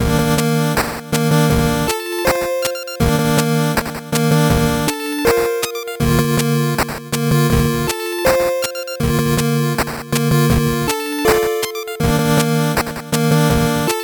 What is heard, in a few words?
8bit bgm slow